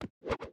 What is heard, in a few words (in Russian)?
Выложить карту на стол